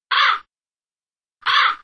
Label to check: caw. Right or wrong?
Right